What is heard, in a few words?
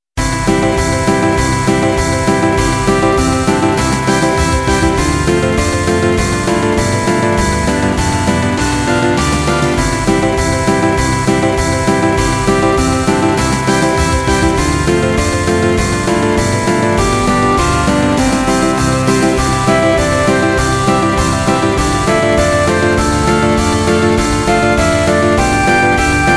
jazz orchestra; pierpont james; jingle bells